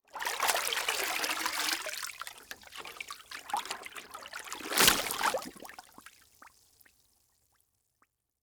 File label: Liquid Water Towel Dripping And Dunking Under Water